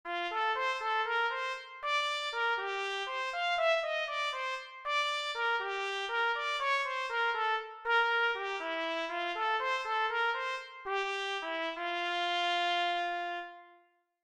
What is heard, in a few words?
trumpet